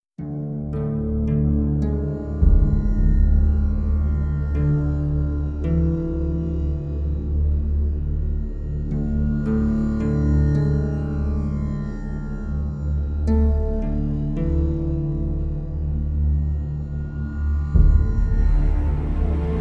Instrumental

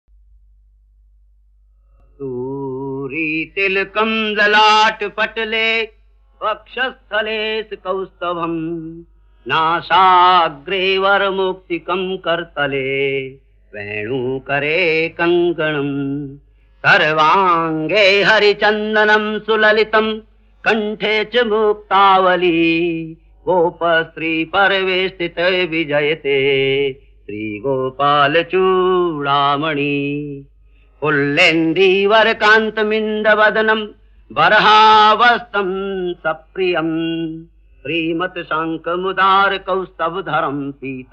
Shlokas, Hindi

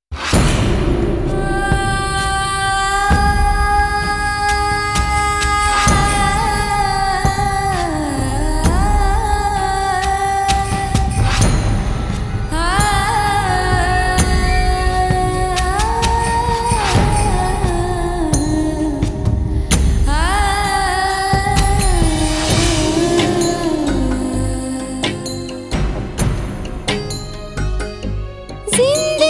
(Female Sad Version)

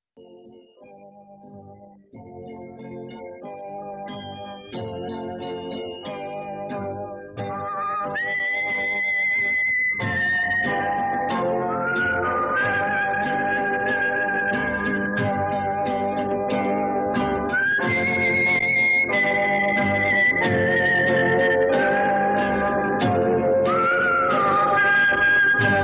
Theme (Instrumental)

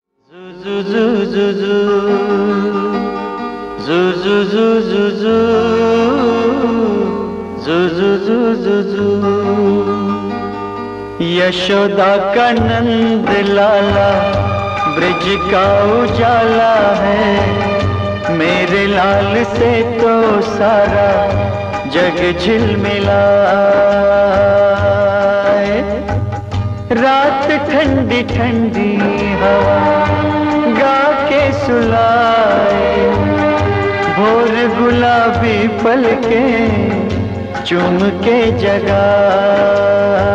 Male

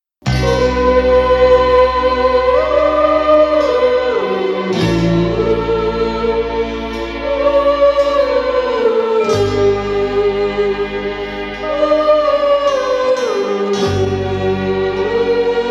(Female Version Sad)

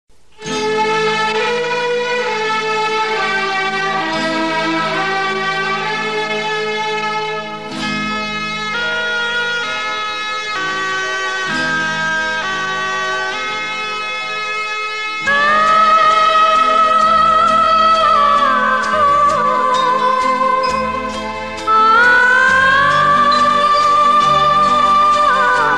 (Sad)

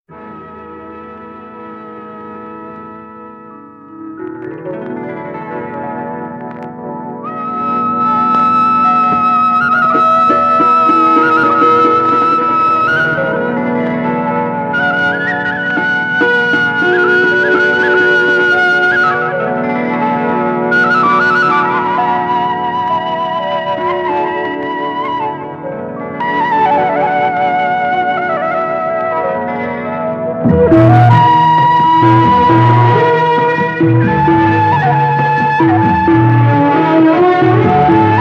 Title Music (Instrumental)

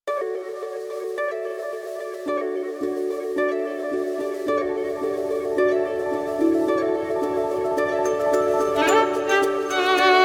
(Instrumental)

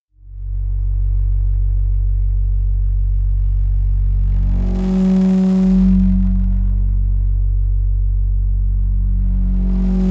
Train Crash Memory (Instrumental)

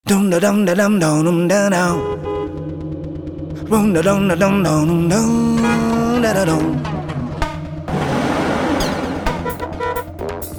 Jam

